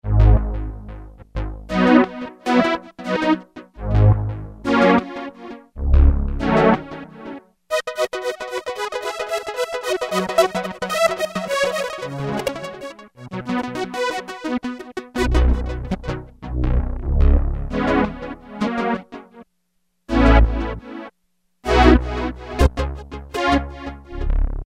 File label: Roland MKS-70, Back Forth Chase